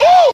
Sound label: "ooh" male